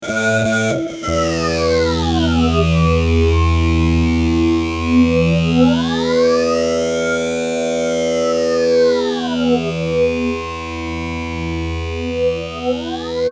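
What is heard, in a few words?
fuzz guitar, final phase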